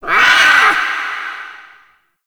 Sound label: Ambient Meow Far; Characters; Warframe; Kalymos